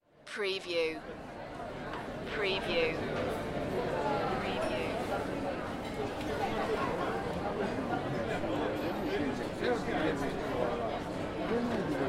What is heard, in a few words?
City Street Ambience With Accordeon